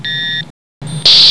MODEM, COMPUTER

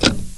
CLUTCH

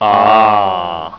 AAH; PEOPLE

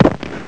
SHOT

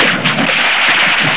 CH SPLASH; JOHNNY

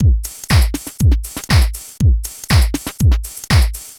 BEAT, DANCE DRUMS; MAGIX

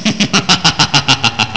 MAGIC

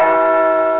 BENCHIME

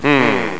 hmm; WINWIZ

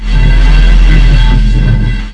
ROTOR; NIHILIST

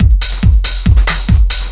DRUM, HOUS-TEC DRUMS; MAGIX

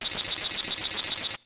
space ray; BEAT2000

